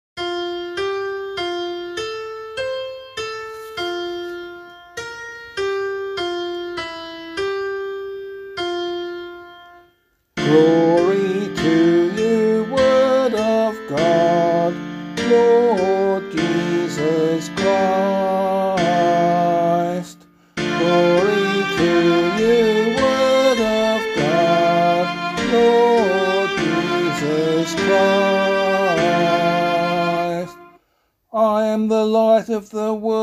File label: Gospel Acclamation, vocal; 4th Sunday of Lent; Year A; Australian Catholic liturgy; LiturgyShare